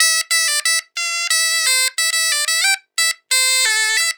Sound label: BOMBAR, Celtic Flavours